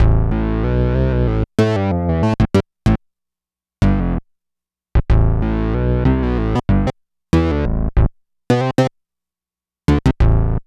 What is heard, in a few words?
BASS LP; Phat & Phunky; East West